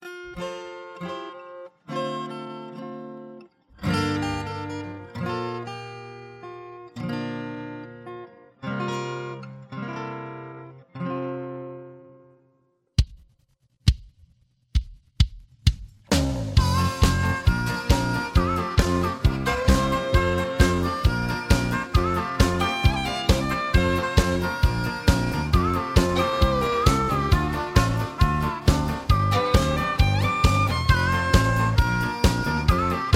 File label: Instrumental High Key